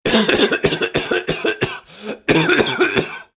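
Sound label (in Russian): sound of male cough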